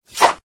slash

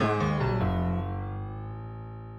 low battery

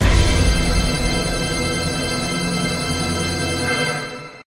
horror sting

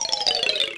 failed

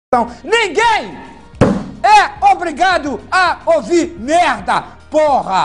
funk

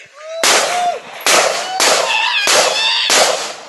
shooting and screaming

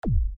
simple heavy laser

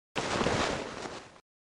large camera sound

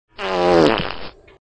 flight fart

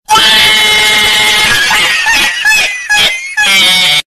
cat laugh slowed down

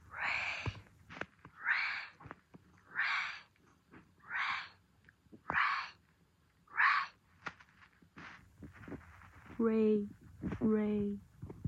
rayhhhh

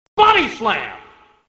body slam

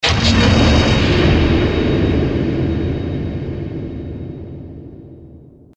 echo; dota2; lotus orb

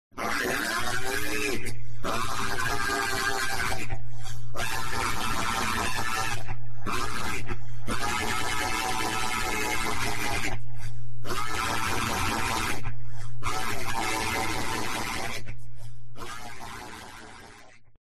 screaming

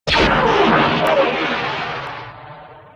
turbolaser

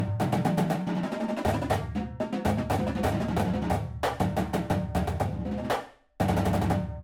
drum stuff

